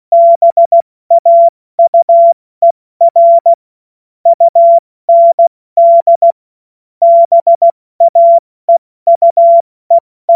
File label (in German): Witz, Morsekurs, cw morsen; Tempo 70 F80; hamradio; cw witze